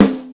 good snare drum sound c key, steel snare drum tuned to the C note